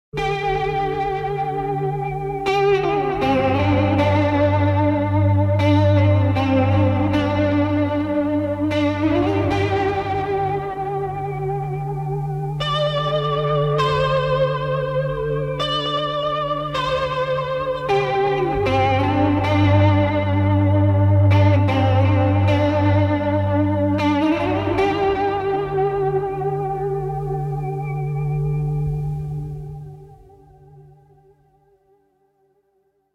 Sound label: Trembling String